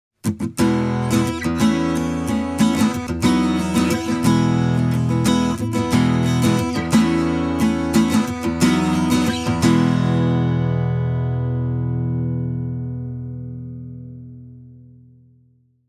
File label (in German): Westerngitarren Takamine GN10NS solo; Rockway